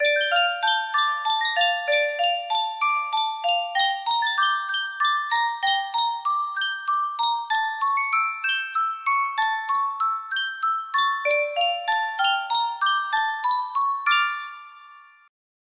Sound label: Yunsheng Musikkboks, music box melody